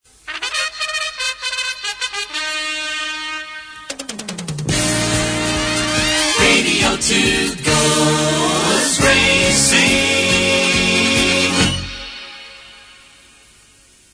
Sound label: jingle